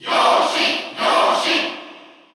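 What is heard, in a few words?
Cheer Russian, crowd; Yoshi; SSBU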